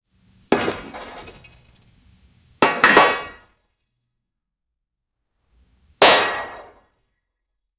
robot falls on a concrete

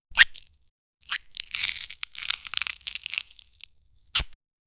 squeezing a very ripe fru

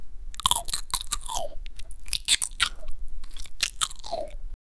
nasty chewing sounds

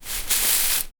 farmer harvesting wheat

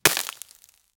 fall pain bone break, human reactions

